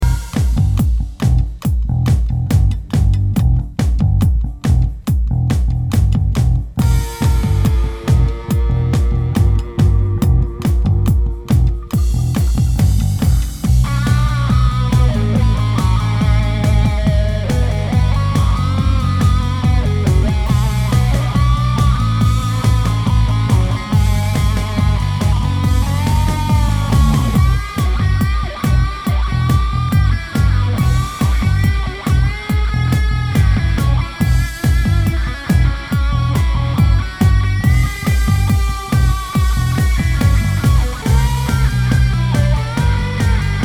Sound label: Overdriver Bass Version